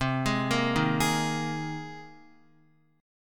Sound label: C Minor 7th Sharp 5th C Guitar; Cm7#5 arpeggiated; JGuitar